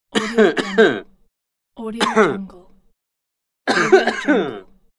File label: cough